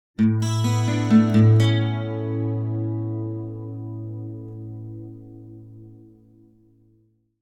Acoustic Guitar - Music Stinger - Picked With Chorus; Hollywood Edge; sound designer's toolkit v2